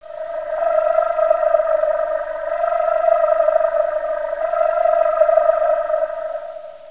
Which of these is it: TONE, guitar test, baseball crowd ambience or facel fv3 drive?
TONE